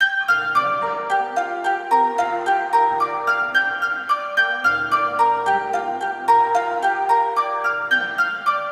Cigar Box Arp G Minor 110 bpm; MIDI Clips; Tonal; Ableton; Factory Packs; Lost and Found

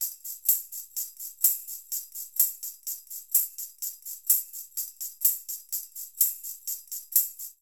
Funk 1 Tambourin 126bpm, Latin Percussion; Ableton; Factory Packs

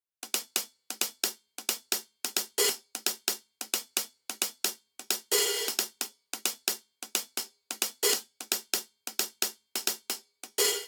Swing Reggae Hihat Only 88 bpm; 4 4; MIDI Clips; Ableton; Factory Packs; Drum Booth

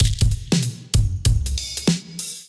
Collective Species Kit groove 96 bpm; MIDI Clips; Drive and Glow; Ableton; Factory Packs

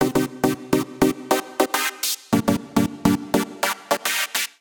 Brass (Cm) 104bpm; Music Loops; Tymez Brass; Ableton; Factory Packs; Singularities

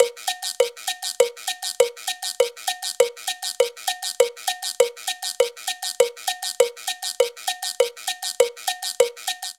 Cumbia Guiro Cowbell 100bpm; Latin Percussion; Ableton; Factory Packs

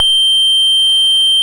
Square G6; Ableton Foundation FreePacks; Foundation Glitch Toys & LoFi

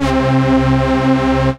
Ensemble Brass, analog; Ableton; Factory Packs; Synth Essentials

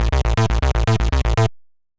Square Three, Analog Bass; Ableton; Retro Synths; Analog Bass Vol 1

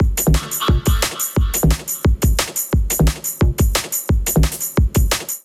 Kit Melodic 176 bpm, Drums, Complex; MIDI Clips; Rollin Breaks Kit; Ableton; Factory Packs; Skitter and Step

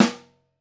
SN1 Overhead, Snare; Ableton Foundation; Snare Designer

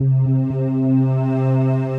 Synth Pad; Serenity

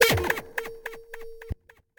Dog Whistle, synth lead; Ableton; Factory Packs; Punch and Tilt

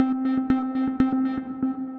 Arp Sample Blender; Melodic Arps

